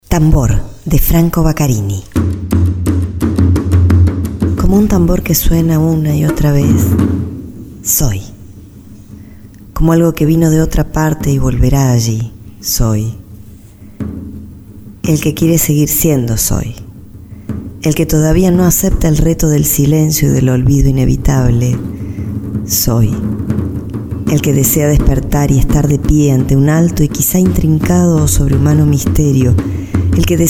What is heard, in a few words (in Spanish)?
tambor